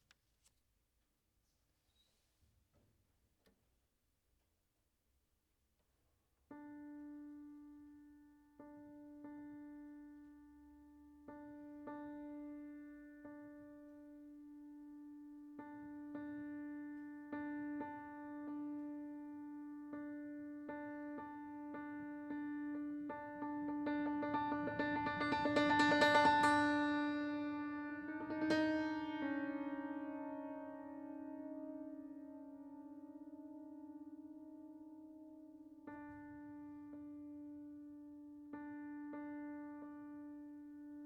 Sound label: pianosolo